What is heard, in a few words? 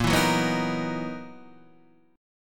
F sharp 13th A sharp